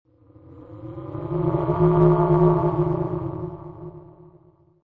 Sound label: distant moan